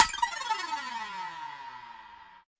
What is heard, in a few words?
cyborg Break Me; UNUSUAL KITS and FX